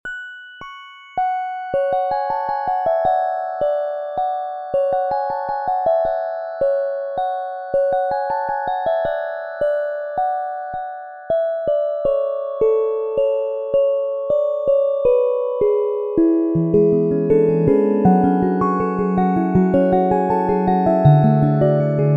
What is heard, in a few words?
music box